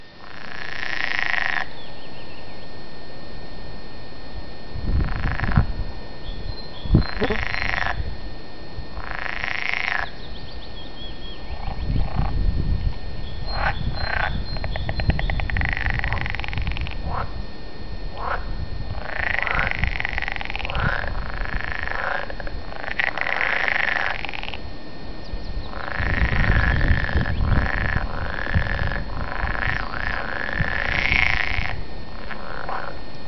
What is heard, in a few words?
frog